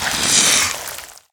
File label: Carrion Grub Attack